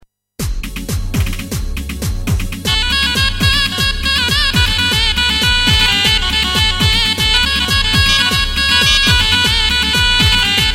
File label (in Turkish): gw8 giresun zurna